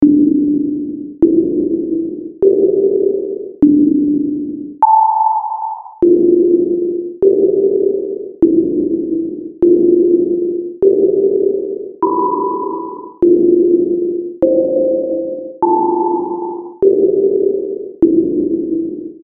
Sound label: Clockwork Occupy Loops